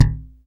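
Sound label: electric bass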